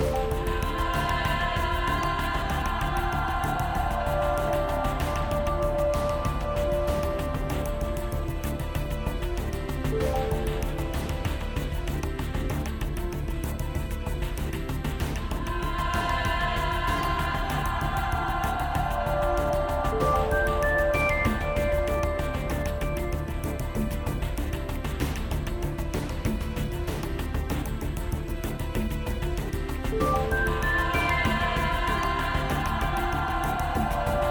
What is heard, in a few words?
Scary loop BPM96